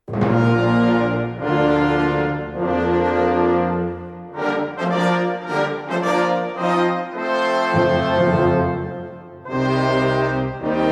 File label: fanfare; wiener opernball